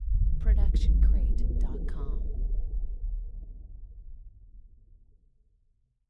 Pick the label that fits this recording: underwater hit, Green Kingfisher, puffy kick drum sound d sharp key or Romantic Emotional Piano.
underwater hit